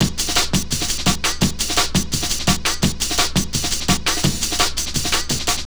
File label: Dope Break, Drum & Bass; Fluid Dynamics